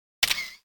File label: snapshot